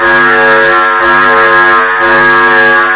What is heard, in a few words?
octagon alarm, ambience